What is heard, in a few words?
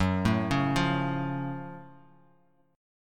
Gb Minor Major 7th Gb down Bass Standard; arpeggiated; JGuitar